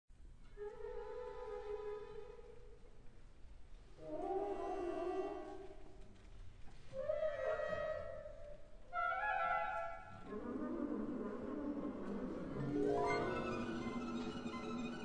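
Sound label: Violin Concerto Pianissimo Scherzo; CORIGLIANO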